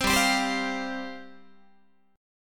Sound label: G 7th Suspended 4th G down Guitar